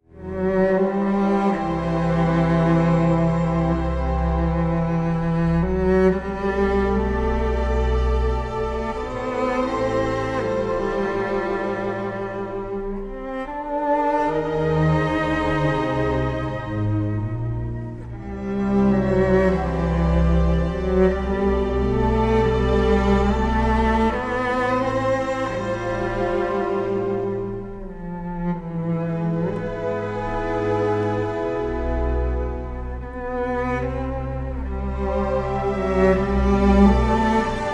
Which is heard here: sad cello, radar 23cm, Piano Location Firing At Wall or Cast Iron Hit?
sad cello